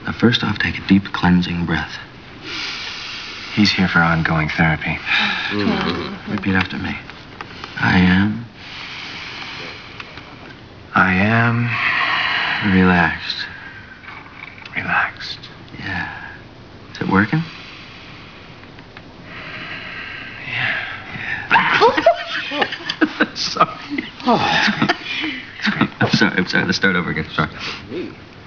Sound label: relaxed